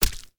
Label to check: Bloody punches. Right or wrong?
Right